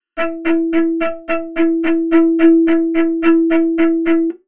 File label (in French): Sautille